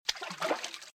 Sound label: Footsteps - Water - Ankle Level; RadwinIsland